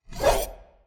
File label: sword swing; player